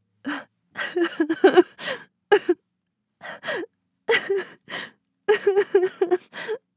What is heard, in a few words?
Young woman crying and gasping